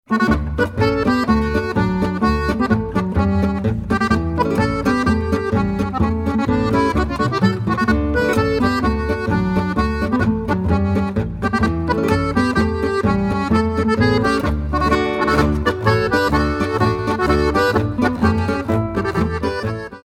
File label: polka